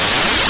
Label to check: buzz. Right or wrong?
Right